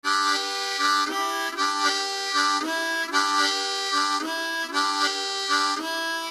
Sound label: Tongue, harmonica